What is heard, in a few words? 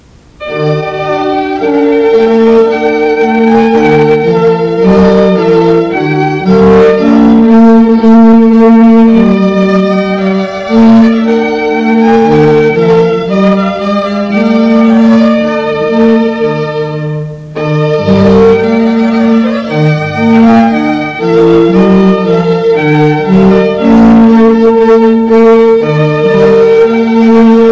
Trumpet Vountary